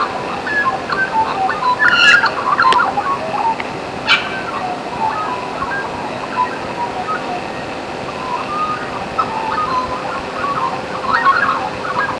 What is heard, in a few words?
magpie carol